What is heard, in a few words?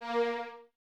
Violins Detache, A#3; 23 Violins; String Ensembles; Miroslav Vitous